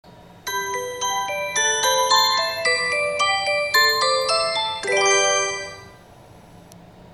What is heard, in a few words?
chime; kyusyu